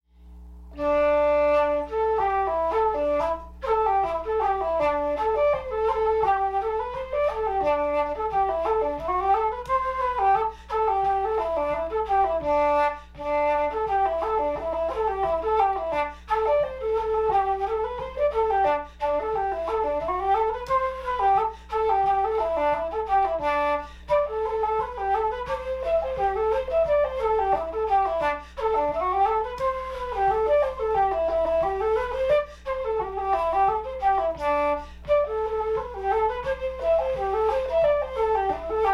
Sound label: SOLO flute